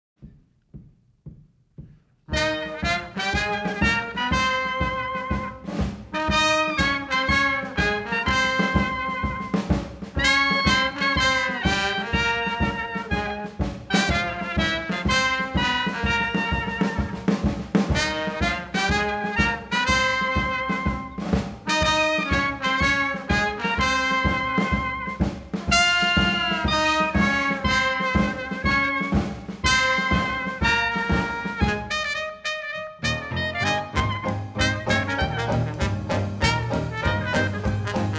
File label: jazz band